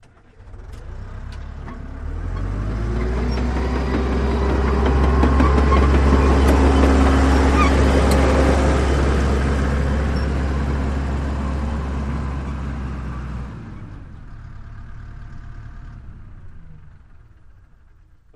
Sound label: Bulldozer Pass By Left To Right